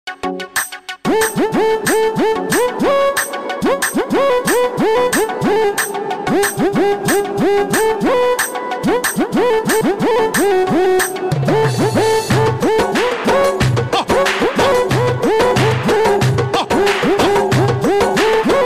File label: arabic remix; obama